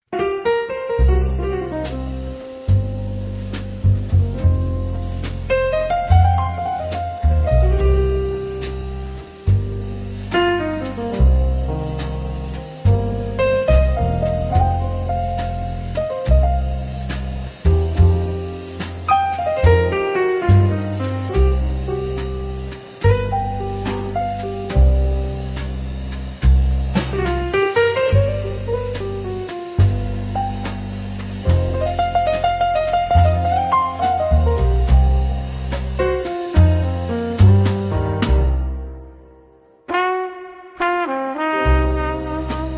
Jazz Club

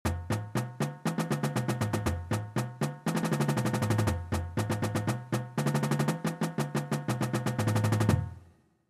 Singles, warm ups

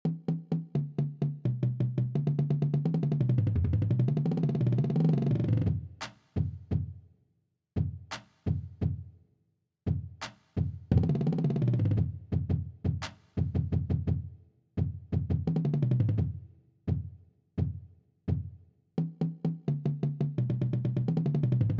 Bass

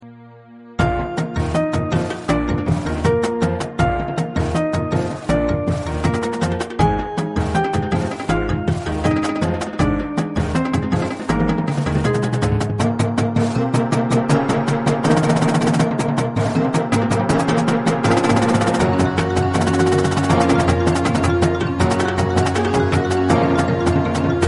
Percussion; The Giving Tree

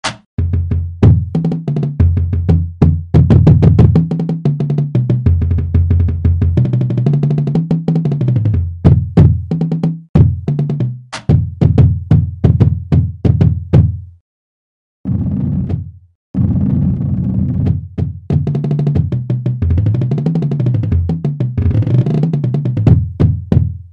The Storm bass intro; Marching Percussion/Bassline

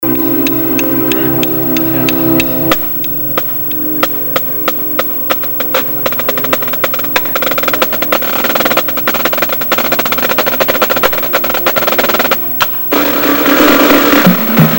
The Storm Opening Percussion Segment; ERHS 2005 Opener

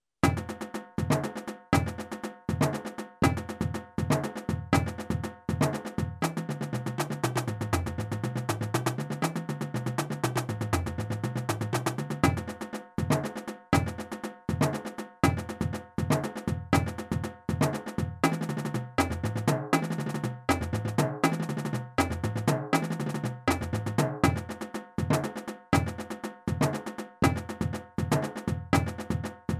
Paradiddle Phunk

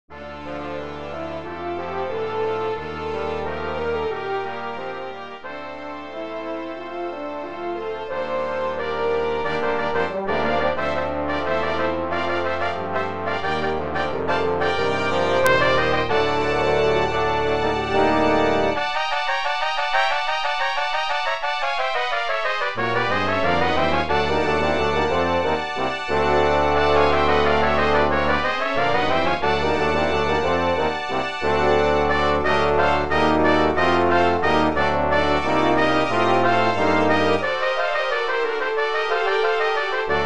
CHORALE

